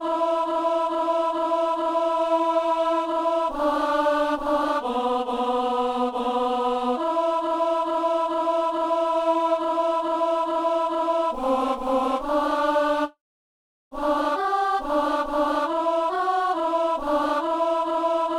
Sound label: alto